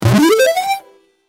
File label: game level pass; user interface